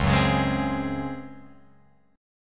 Db Major 7th Suspended 4th Db, Guitar Open F, chord; strummed; JGuitar